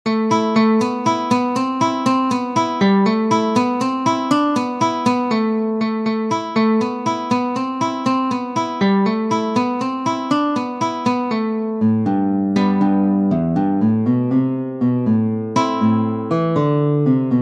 round dance, guitar

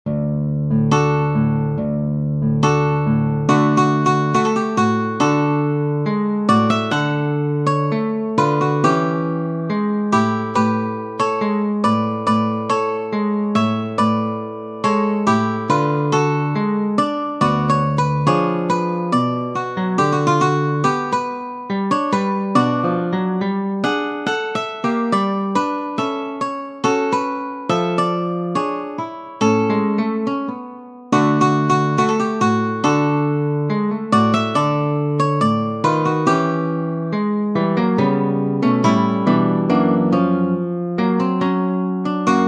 Tango; Albeniz Isaac Opus 165 No 2

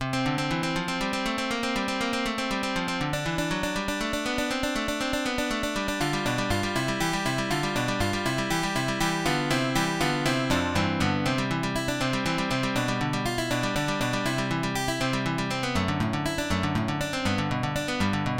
Allegro vivace, guitar

